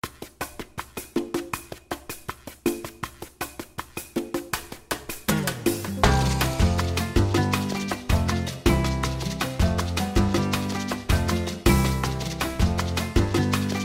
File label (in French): MUSIQUE ANIMA'GYM; GAF; OPEN GYM